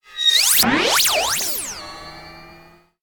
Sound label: Magic Bouncing Bolt